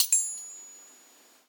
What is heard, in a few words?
ping